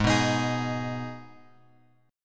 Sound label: G sharp Minor G sharp, chord; G#m Chord {4 2 X X 4 4} strummed